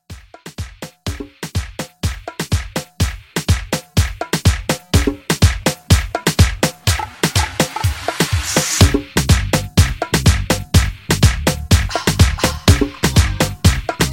80s Mambo Re Drum

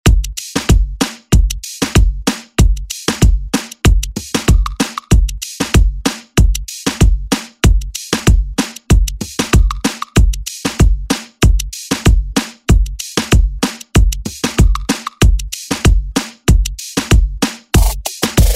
Party Hype Redrum

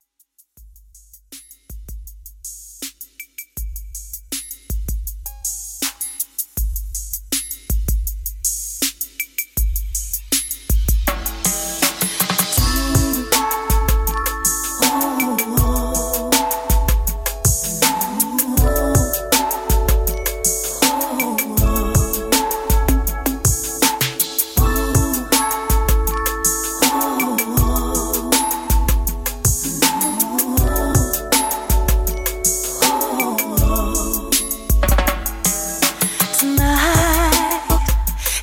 80s Pop Reggae Redrum 160Bpm

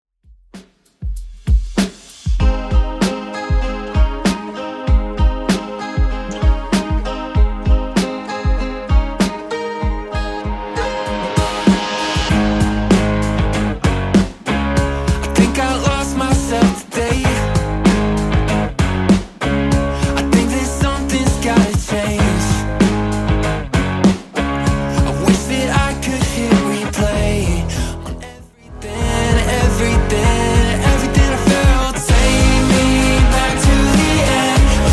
Top40 Xtend, 97 bpm

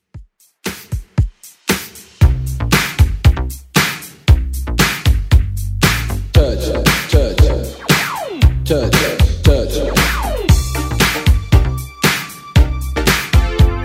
80s redrum 116 bpm

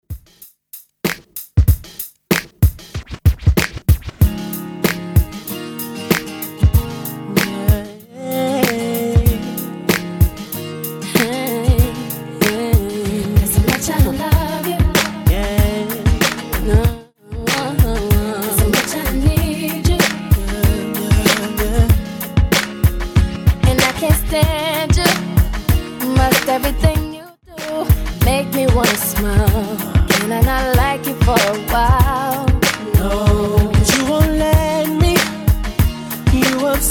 Throwback Redrum 95bpm

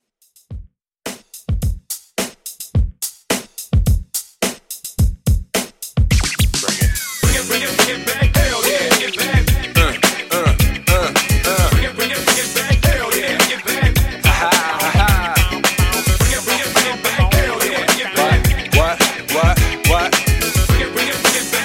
Old School Redrum 107Bpm

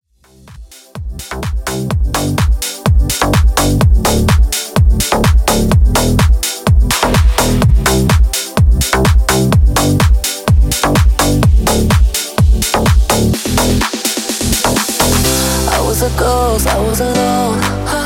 Remix 126BPM

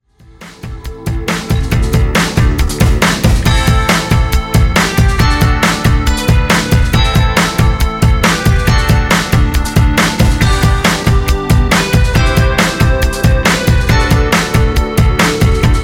Classic Redrum 138 Bpm

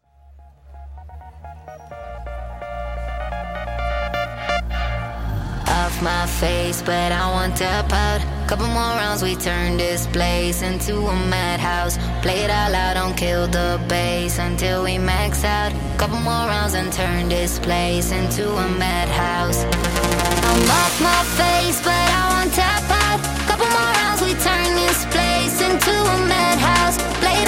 Madhouse Future House; Remixfreakz

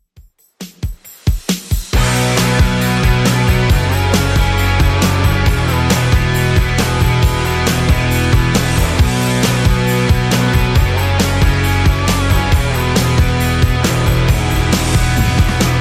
90s Rock Redrum 135bpm

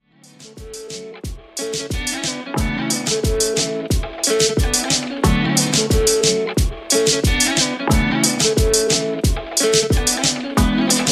In Outro Reton 90Bpm

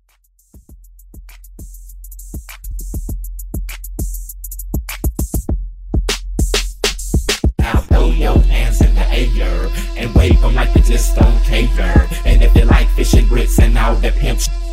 Party Break Intro

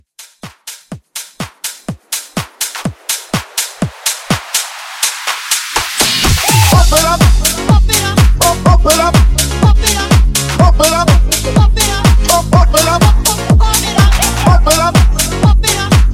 Club House 124

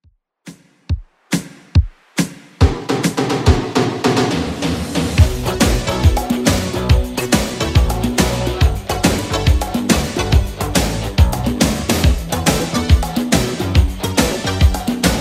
80s Redrum 140bpm

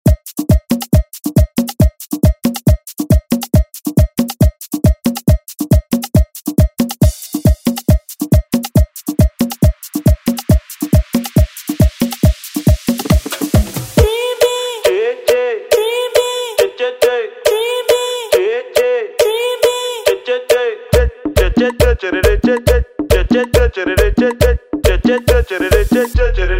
Latin Redrum; Deejay Hit

